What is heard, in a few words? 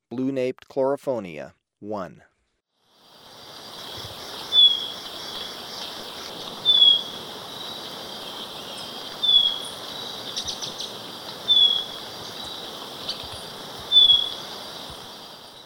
Blue-naped Chlorophonia, Ecuador; S. America